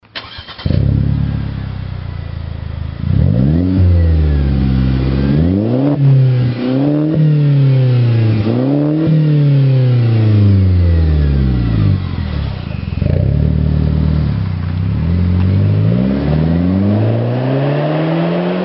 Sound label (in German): 4 Zyl Start Stehent und Losfahrt